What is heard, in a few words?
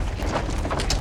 minecart, inside